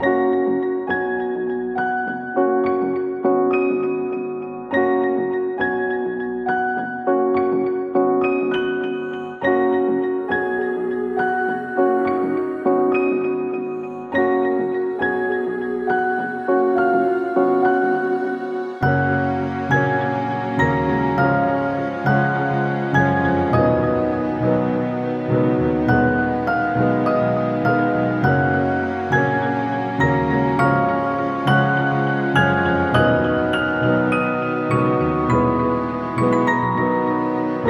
Morning (Sad); Daigaku Gurashi

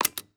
laser

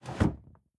Hiding Spot Exit Door; Devour; The Inn; devour 2021 gamerip pc macos

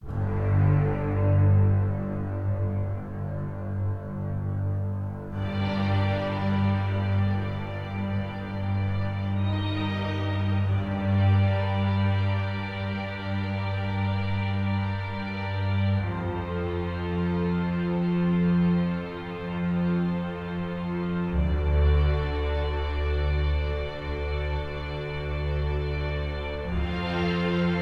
Strings; Age of Conan Hyborian Adventures

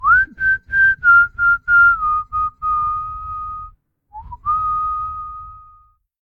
Idle Whistle; The Town; The Inn

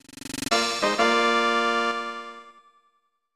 Garbage Collecting Fanfare; Shigesato Itoi's Bass Fishing No. 1; SNES

